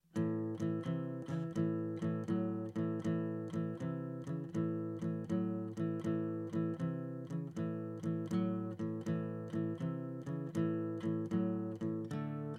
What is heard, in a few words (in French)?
shuffle tierces